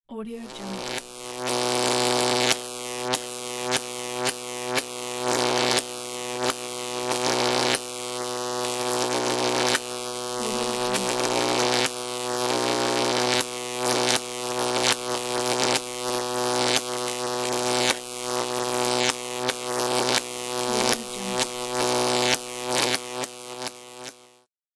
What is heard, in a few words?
electrical charges